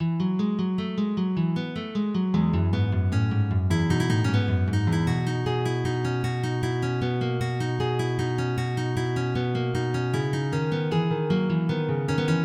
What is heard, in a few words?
music; General MIDI